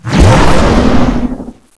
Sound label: tornado launch; cstrike; next21 kart